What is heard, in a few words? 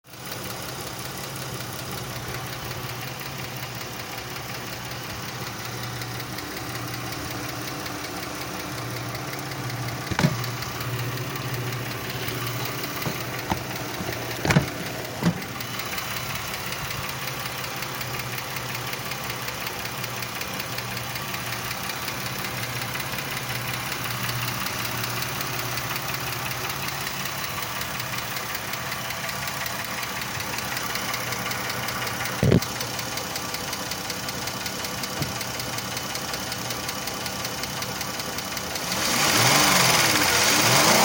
grand caravan engine noise; dodge caravan